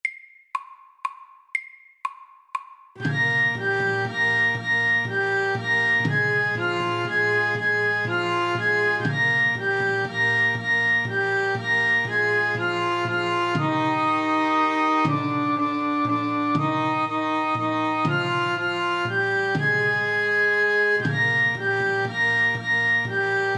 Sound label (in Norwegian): Irsk melodi MEDIUM 120